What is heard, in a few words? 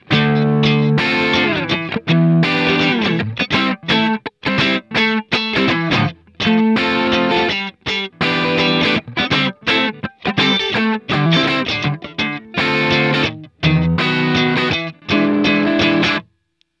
Groove Guitars Dry